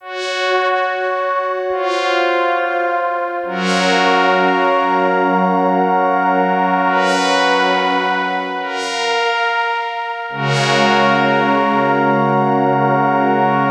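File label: Melody Loop D# minor 140BPM, Dubstep; Murk; Ghosthack; Dubstep Sounds 2025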